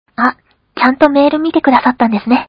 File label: hum